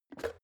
plastic water boiler lid off

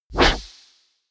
wipe

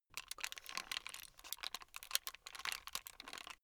plastic rattle slow

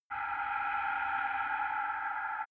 windy dark shuttle

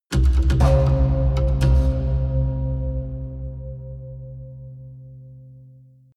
haunted strings rhythmic sequence low

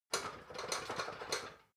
ladder metal rattling

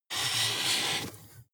marker mark soft

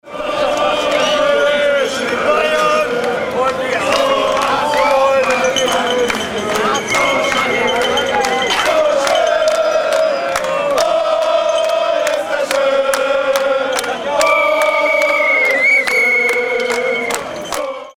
soccer fans in germany

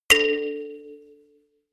notification kalimba rattle fifth

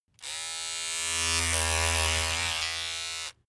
razor on load short close

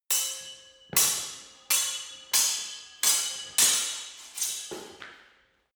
sword hit sword sequence

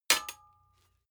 sword metal hit ring clank

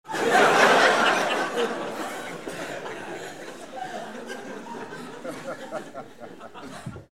laugh long

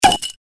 drinks beer bottle opening plopp

